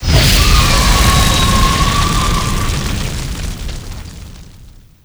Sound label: fire pain; Quake 4 Alpha; id software; strogg; iron maiden